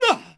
Cbmn Hit Vox; Civ4 warlords

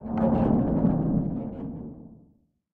plane metall stress underwater

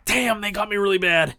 hurt heavy; Escape from Tarkov

